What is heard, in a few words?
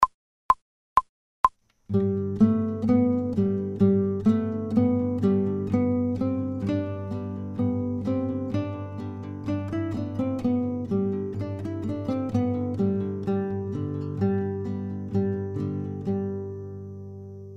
fast, guitar, without metronome